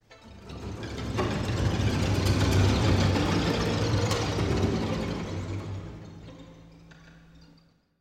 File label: sounds music; Halo Combat Evolved; Xbox